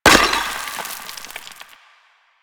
Destroy Planter Hanging; Warframe; Ambience; Nineteen Ninety Nine

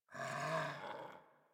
Puppy Sleep Idle Snore; Warframe; Kubrow

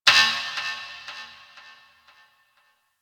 Ship Energy Knock; Warframe; Corpus Ship Remaster

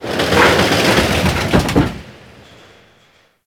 Shipyards Conveyor Cart; Ambience; Props; Warframe; Grineer Shipyards

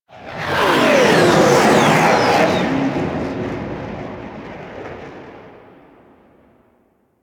Ship Fly By A; Sentient; Warframe; The New War; Plains Of Eidolon; Mission One

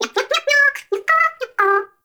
baby vocal singing

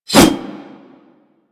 Tonfa Whoosh; Warframe; Tenno

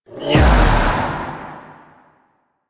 Execute Heavy Strike; Warframe; Warframes; Pax Duviricus

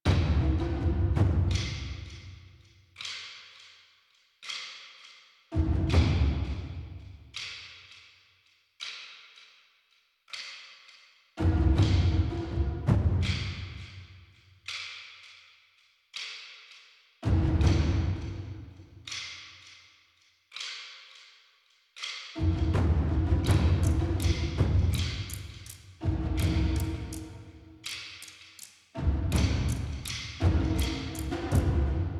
Plains Day One Amb Perc; Warframe; DE; Dynamic Music; Eidolon Plains

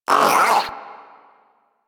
Vocal Pain, Pets; Warframe; Zanuka; Zanuka Companion A

